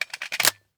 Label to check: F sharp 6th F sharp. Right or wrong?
Wrong